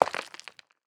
fantasy material stone light hit; esmteam

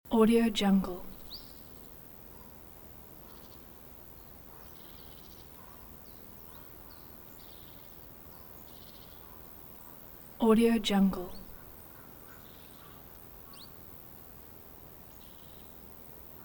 silent autumn forest ambience loop

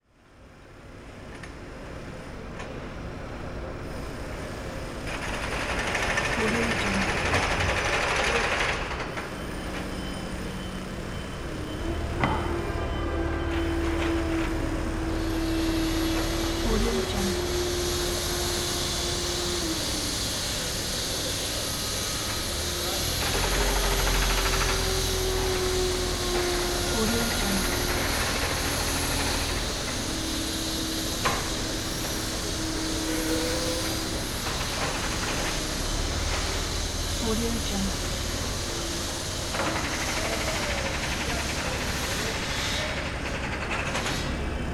plaza construction ambience

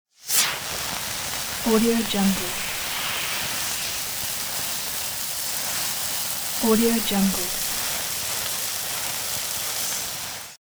fuse

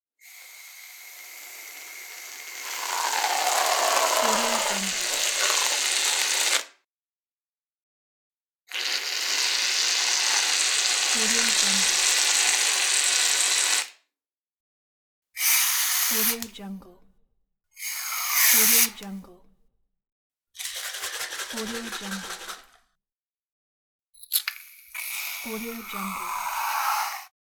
shaving foam

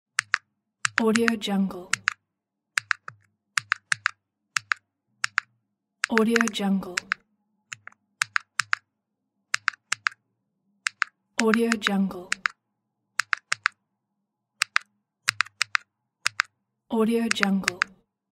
pressing the buttons of the calculator

